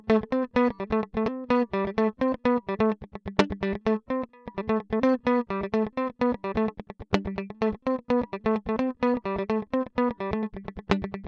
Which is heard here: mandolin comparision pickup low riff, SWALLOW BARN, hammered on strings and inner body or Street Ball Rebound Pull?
mandolin comparision pickup low riff